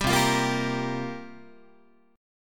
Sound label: C 7th Suspended 4th, Guitar, chord